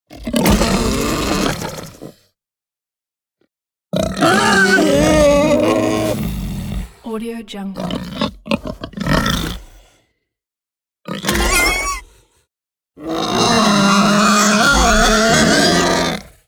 monster boar

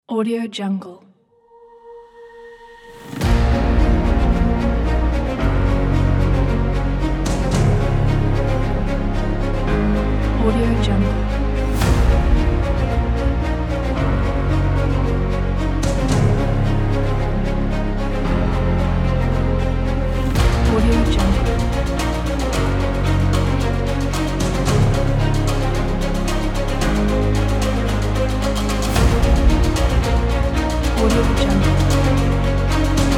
epic cinematic trailer; mountainsounds

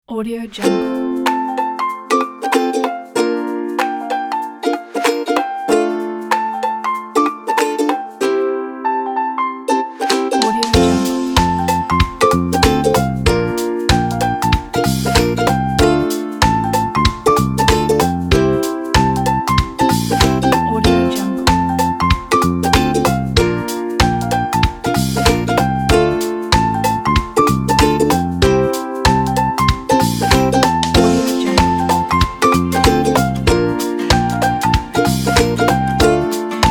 happy fun music ukulele pack

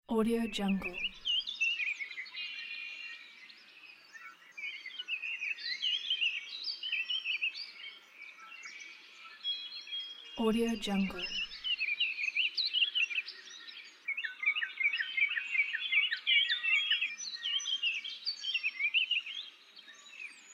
birds

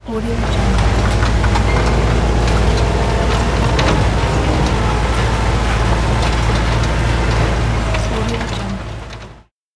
excavator

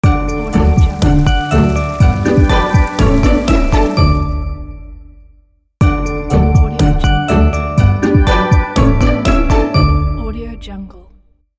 inspirational upbeat logo

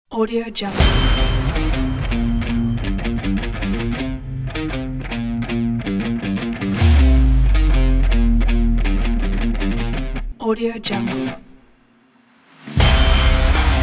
energetic rock trailer

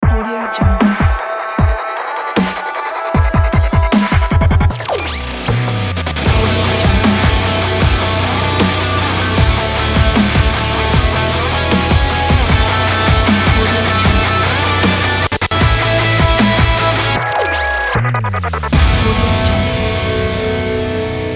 epic win achievement dubstep rock ident; alexanderrufire

